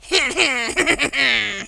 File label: laugh, French Lover; speech